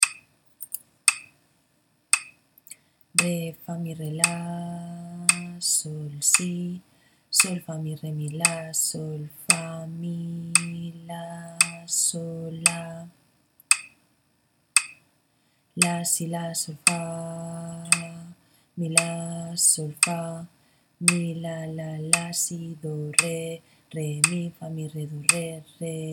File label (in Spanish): ritmo 6-4; Equivalencias